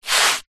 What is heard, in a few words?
footstep single scrape scuff sandal concrete light dirt; foley; zapsplat